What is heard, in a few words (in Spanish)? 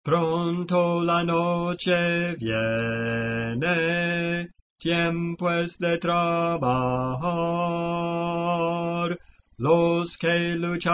Bajo, Cantos Espirituales; Clase del Canto